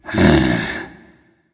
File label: thinking; camazotz